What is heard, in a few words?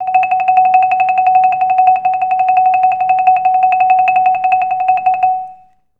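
TREMOLO F#5, Orchestra; Best Service; Peter Siedlaczek's Advanced Orchestra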